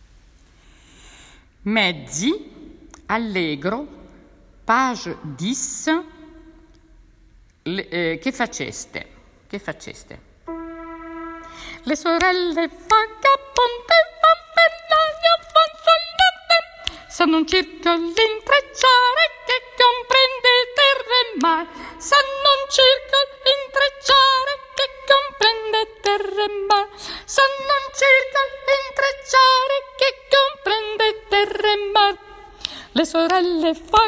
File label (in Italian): allegro mezzi